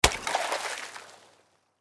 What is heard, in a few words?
Impact Water Stick Break Ice Splash Pond; Splashes; Sound Effects:Objects; Blastwave